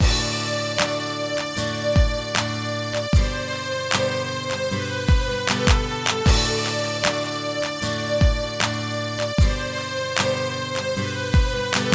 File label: Guitar Soundscape